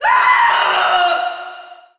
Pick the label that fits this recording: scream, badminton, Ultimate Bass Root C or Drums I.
scream